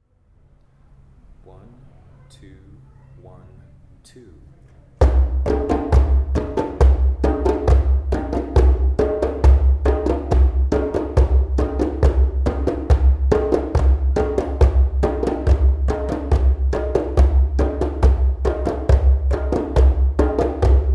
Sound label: gallop, drumming